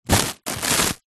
household cling film wrap sheet touch pull hand away